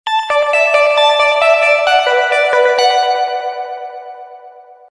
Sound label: iphone epiano